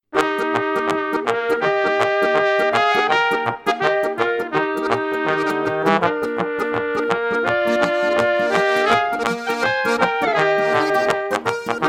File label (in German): Polka